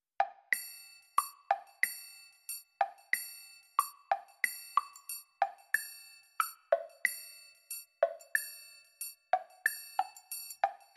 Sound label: Light Percussion and Mallets